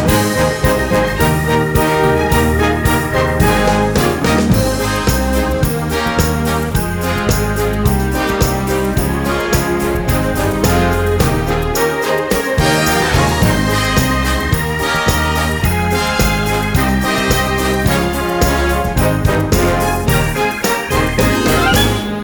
Jingle Bell Medley Jolly Old St Nicholas no vocals; Sawgrass Elementary